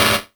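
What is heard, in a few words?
Hihat Closed FX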